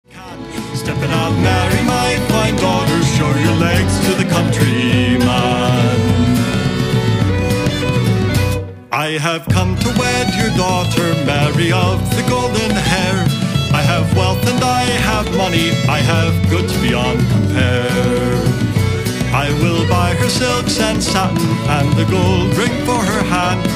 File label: Irish Band